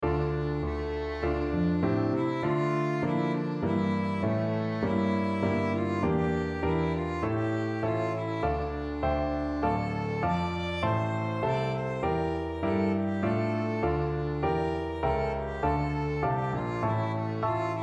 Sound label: Violin Sonata Allegro opening